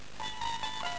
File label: Horror Asterisk